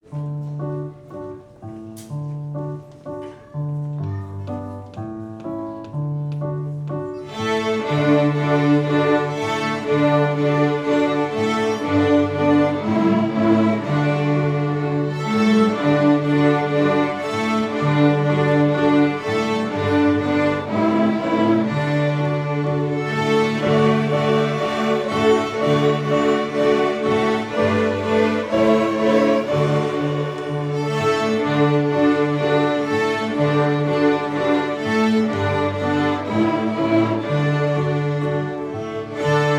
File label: string fling